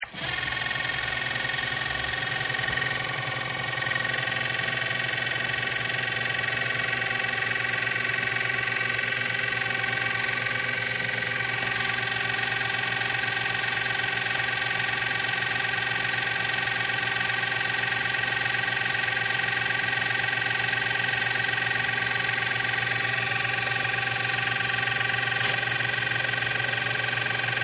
Nasal Irrigator Low, Nasal Irrigator Running On Low Water Pressure Setting; Bathroom; Household; Michael Huang